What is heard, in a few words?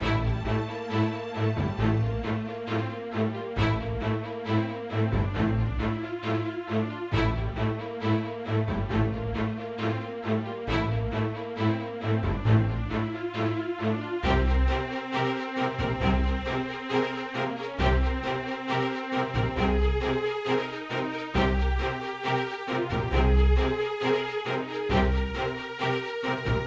Orchestra Layer; Chroma Shift